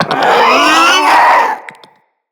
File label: Infested Ancient Charge; Enemies; Puss Ancient